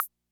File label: Perc, rhythm330; classic machines